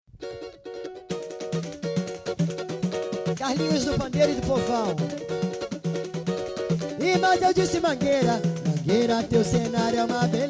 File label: Samba